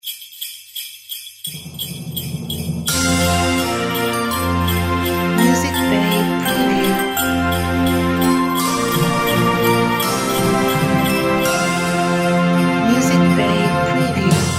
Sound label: Joy to the World Logo